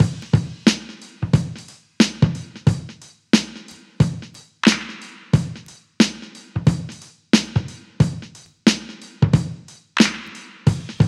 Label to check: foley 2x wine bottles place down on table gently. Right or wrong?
Wrong